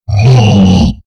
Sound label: hit, troll